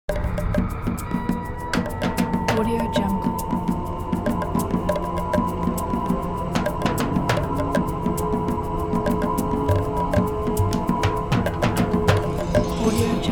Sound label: dark mysterious percussion